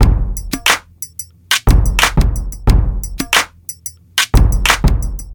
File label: 90 bpm old school drum loop sample g key